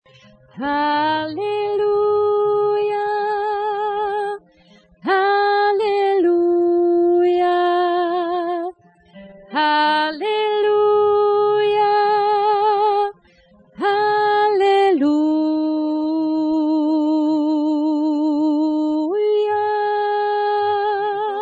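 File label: Swedish tenor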